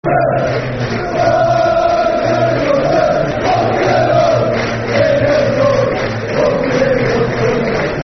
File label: Football Chant (Soccer Song); One Gary Johnson; Bristol City Bcfc